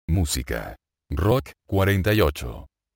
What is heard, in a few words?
rock